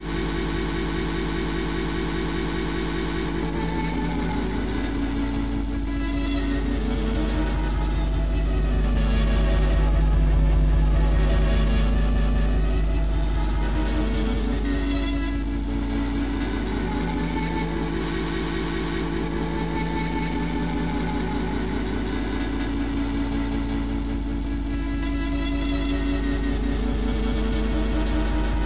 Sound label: String Quartet Floppy Disk